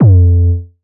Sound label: bass 808 bump F; Polyend; ARC Noise